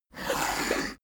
poker chip slide table

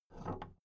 big plastic case handle take soft; objekte; kiste

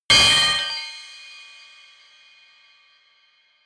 metal clanging; hits explosionen

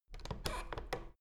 door plastic handle pull down creak soft

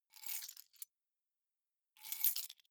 key ring grab stone; objekte; schluessel

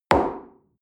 coffee place table medium short

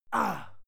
male fight ah soft; schreie; menschen; soundpool

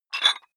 dumbbell pull up rattle soft, fitnessstudio

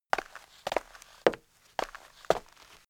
step shoe on street

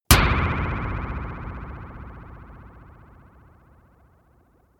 sci-fi metal hit wobble long; whooshes rises; hits impacts

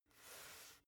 basketball turn swipe short

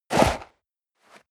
step leather shoes sand jump

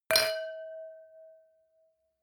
wine glass similar clink bounce hard; berufe; gastronomie; soundpool

